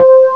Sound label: sd90 classical detuned ep1 high; tumbledemerald